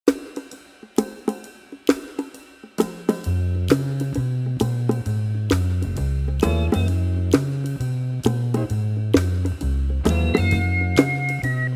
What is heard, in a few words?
Danes Groove